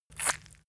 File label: leather impact mud hard squeeze